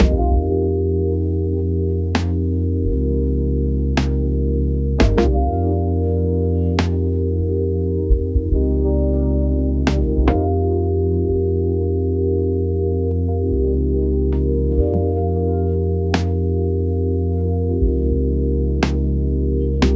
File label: funky lo fi groove with s, groove